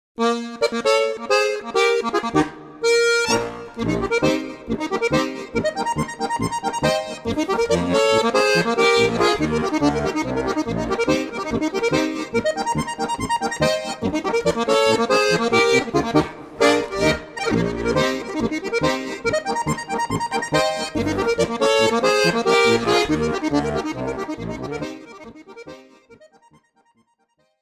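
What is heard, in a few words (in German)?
Harmonika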